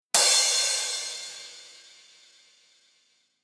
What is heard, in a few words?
cymbal; 効果音